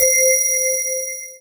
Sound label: Synths C; Vengeance Samples